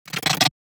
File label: Emote; Evolve; Gorgon